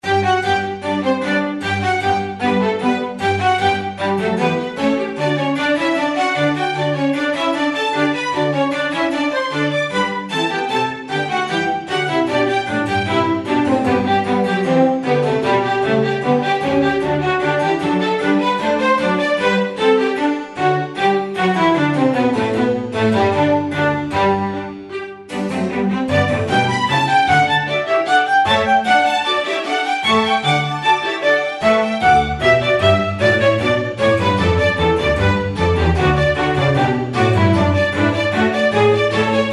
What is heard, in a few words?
classical track, Background Music; Classical & Orchestral